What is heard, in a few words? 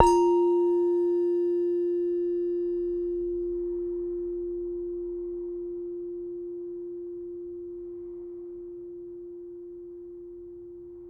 Saron D, Gamelan